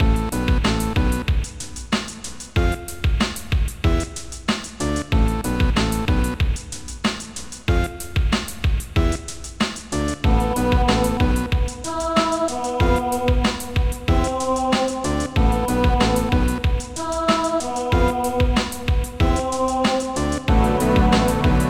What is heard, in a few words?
strange synth